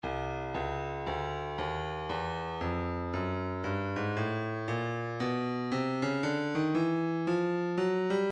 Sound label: breakbeat 058 bpm; Stylus Breakbeat Midi Files; Wallfly C